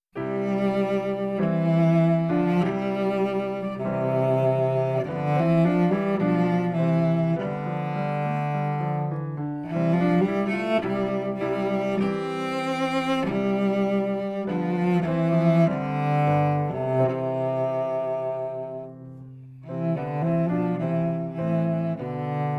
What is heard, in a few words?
Chorus, Cello; Suzuki Cello Vol 2; Judas Maccabaeus